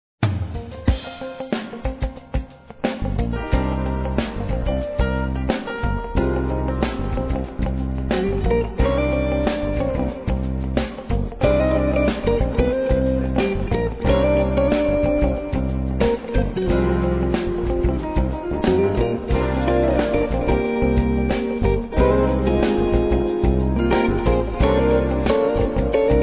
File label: Tasty Jazz